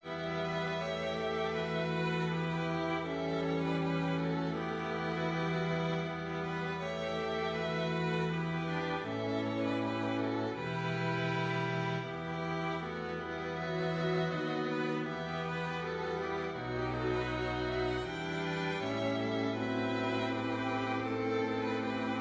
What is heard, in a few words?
Hymn Strings CHRISTMAS; Hymn 204